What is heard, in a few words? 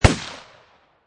Pistol Rhuger 44 Magnum Single Shot Designed; Gun / Pistol: Rhuger Magnum 44, Single shot, Designed